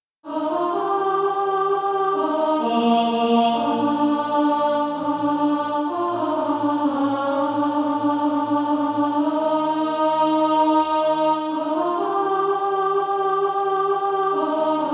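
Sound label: alto, Practise Music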